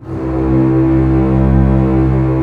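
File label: strings C1 FF; Sound On Sound - Peter Siedleczek's Orchestra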